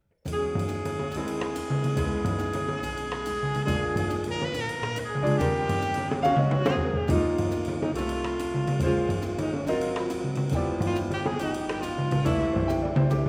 RCA 77 Dx, microphone; Das Mikrofon, Vol. 2; The History of the Condenser Microphone

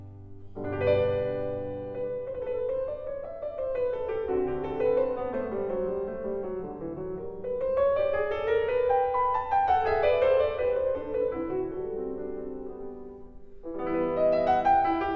Variations for cello & piano in G major; on Handel's ＂See, the Conqu'ring Hero comes,＂ WoO 4; Beethoven

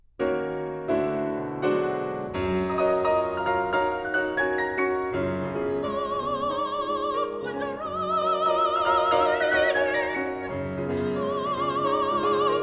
for voice & piano (or orchestra); Love went a-riding

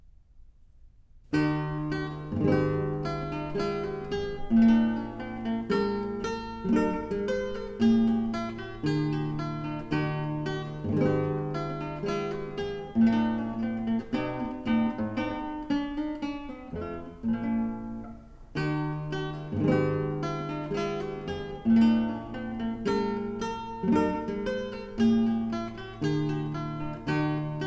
solo cello; No. 6 in D major; BWV 1012; Gavottes 1-2; Bach for Babies; Fun and Games for Budding Brains

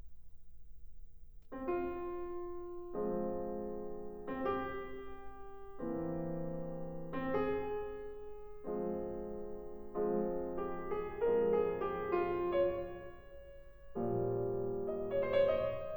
Variations for cello & piano in F major

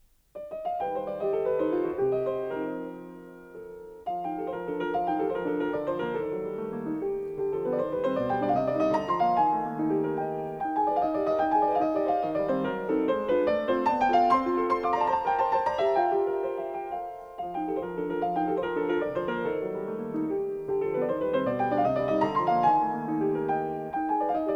for piano, Schnell; Schumann; Waldszenen (Forest Scenes); Freundliche Landschaft

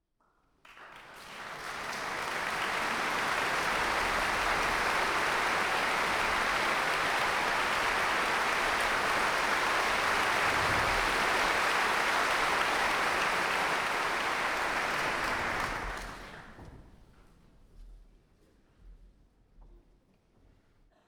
Applause; Piano concerto No. 13, 15

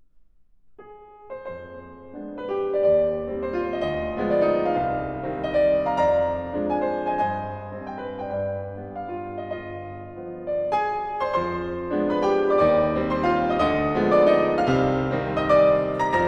Carnaval for piano; Chiarina; Schumann

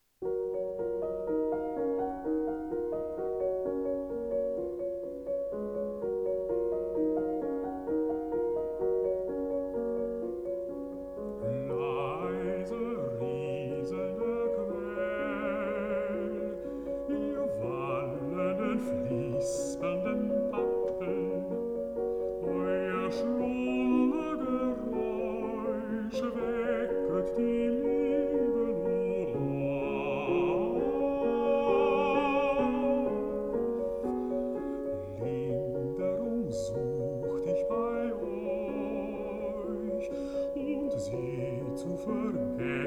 song for voice & piano

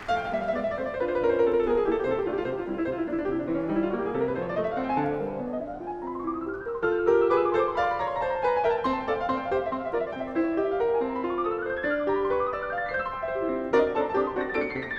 Etude for piano in F major

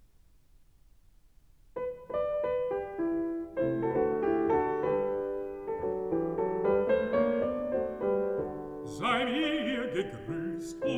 song for voice & piano

